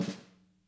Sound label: SNAR, Interactive Drum Kits